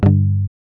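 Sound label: GUI G, acoustic guitar; Best Service Gigapack 2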